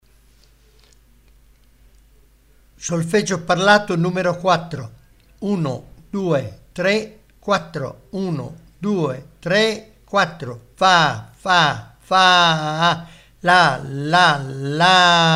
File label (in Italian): Solfeggio Il mio amico flauto